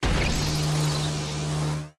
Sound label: thunder; enemies; aquariumboss thunder; unsighted